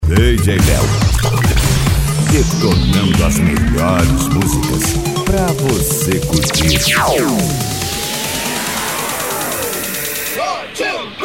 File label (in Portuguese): electro funk e electro dance remix